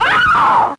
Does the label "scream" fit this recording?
Yes